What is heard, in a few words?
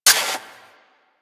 Harpoon Gun Mech Stop; Warframe; Sharkwing; Grineer